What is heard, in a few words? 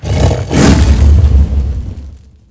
boss fire; level 4